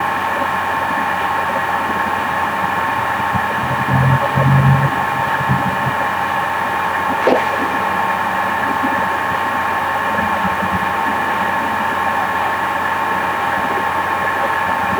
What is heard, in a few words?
hard drive